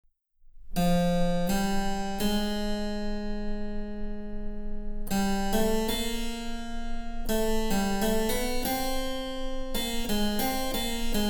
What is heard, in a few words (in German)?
Cembalo; Mark Lindley WTC 1